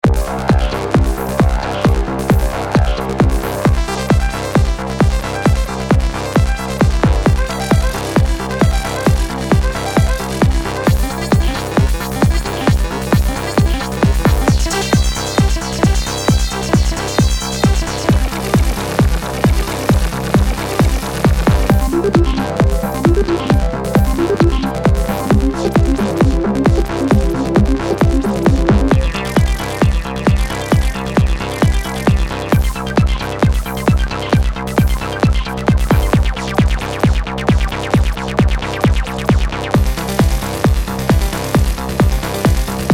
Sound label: Wasp1